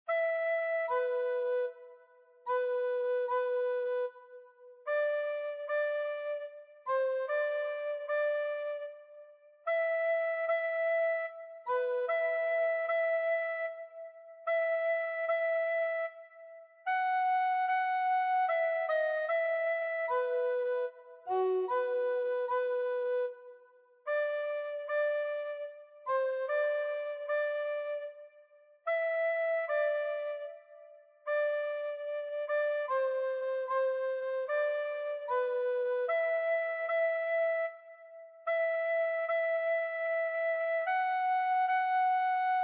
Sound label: sarabande recorder quartet tenor recorder; handels